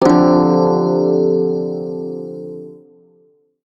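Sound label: Prime Shawzin Major Chord, Music; Warframe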